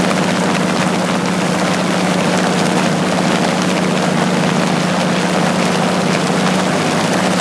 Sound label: engine ai plane rpm 50 close; War Thunder; engines